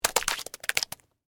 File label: Splatter Mud Debris, Texture, Splatter, Mud, Debris, Drop; Drops; Objects; BlastWave